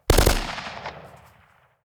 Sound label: assault rifle; prosoundfx